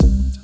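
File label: Kick, Drums; Thicc Kicc; BB 2HTC Samples Vol 4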